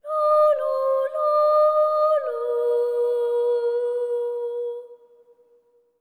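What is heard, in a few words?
BOY phrase, Symphony of Voices; Spectrasonics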